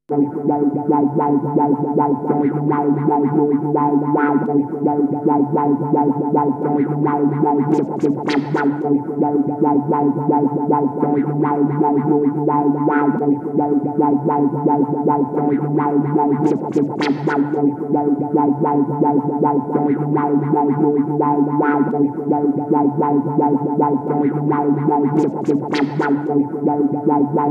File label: synth bassline